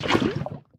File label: fluid unsoak viscous; TFC Quick Pockets